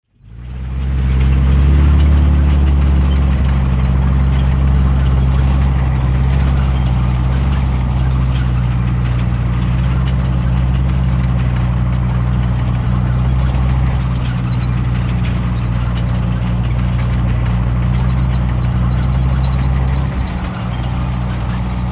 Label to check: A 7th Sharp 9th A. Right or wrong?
Wrong